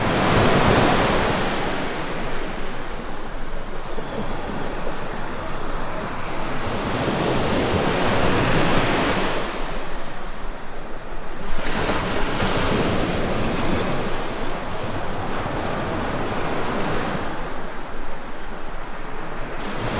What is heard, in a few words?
waves shore